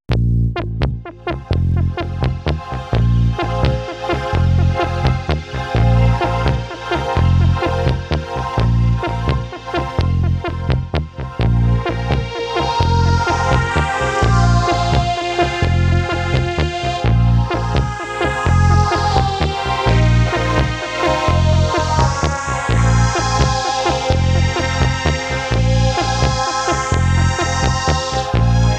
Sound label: Four Crumar Performer, synthesizers